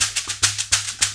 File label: Shakin', Loops & Phrases; Roland BK-5